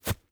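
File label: grass, steps; mining sounds; Terrarum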